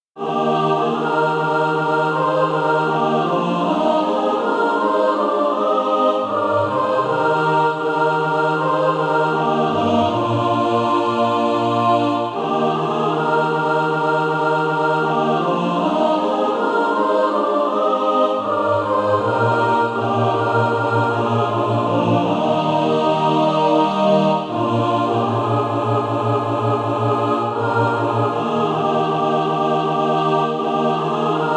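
mixed, Sheet music (SATB) including Practise Music; Darling Nelly Gray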